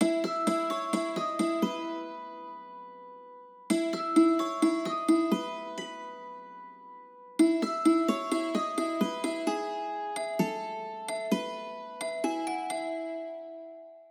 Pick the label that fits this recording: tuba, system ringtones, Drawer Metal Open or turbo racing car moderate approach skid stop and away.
system ringtones